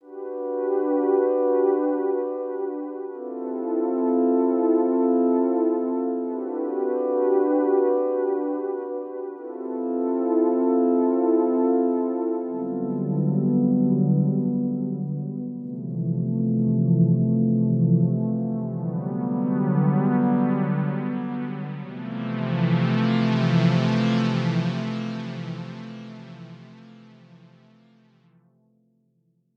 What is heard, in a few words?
Saw Pad